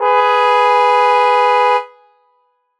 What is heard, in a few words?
Car Horn Honk; 喇叭2